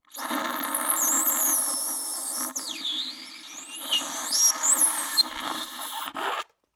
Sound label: saxophone